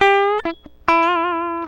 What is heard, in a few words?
BEND LICK, Gtr; PlatinumPhattCD2; E-MU Producer Series Vol. 8 – Platinum Phatt